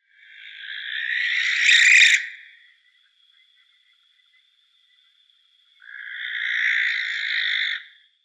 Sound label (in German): Schleiereule Tyto alba